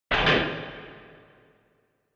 Retro Anime Mech Equip

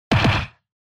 Hit Punch Dry; 打撃 パンチ02-4(ドライ)

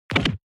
Motion Fracture

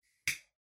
Lighter Far Click

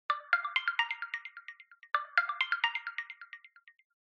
Smartphone Alarm Speaker

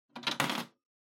Public Phone Coinbox Drop 100 Yen

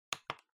PC Operating Noise Button

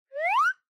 Slide Whistle Short Up

